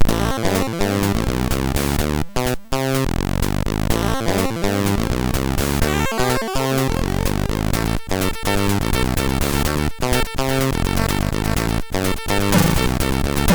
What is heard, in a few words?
Funked Theme, sid MOS6581R3; Digger; hvsc